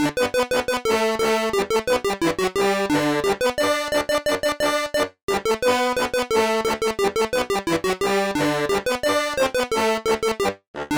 Wrong Anthem; timmy turners globe run 2023

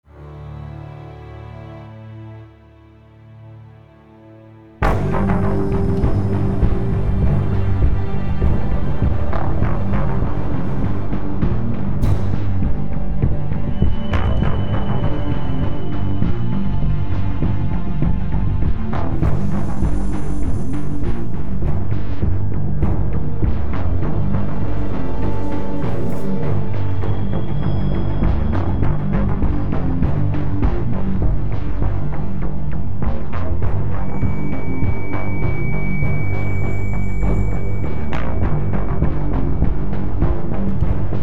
Stealth (Loop); The Walking Dead: The Telltale Series

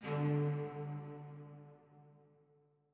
chime; chime sharp

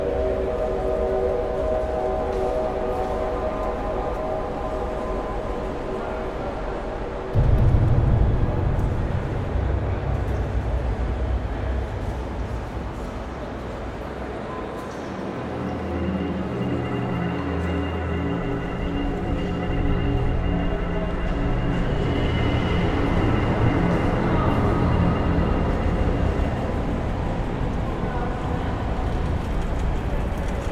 Fx Space station innen loop; darkstar one; windows xbox 360

